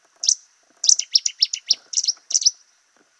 bouscarde

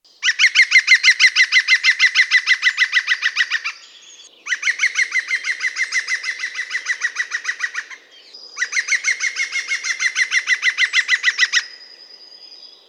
piv vert

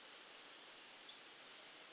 grimpereau

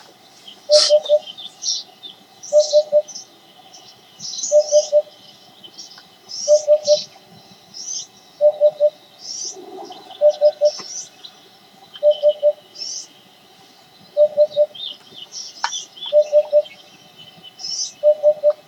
huppe; Huppe fasciée; Le quartier de la Torse héberge une faune importante et notamment une foultitude d’oiseaux

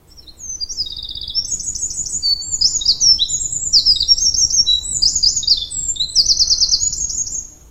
troglodite

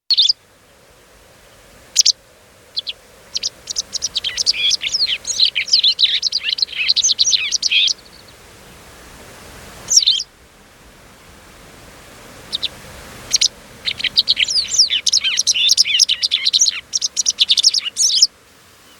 bergeronette

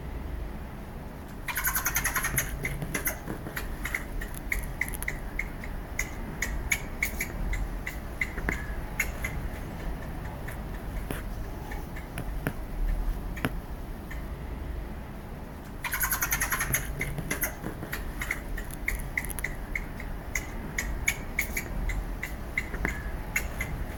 ecureuil

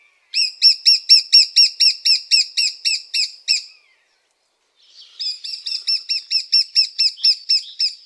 epeiche

